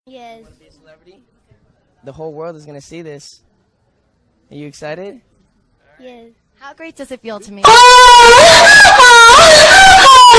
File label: moaning